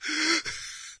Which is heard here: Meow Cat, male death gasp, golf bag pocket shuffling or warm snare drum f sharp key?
male death gasp